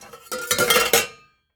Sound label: Pots Put Away, Kitchen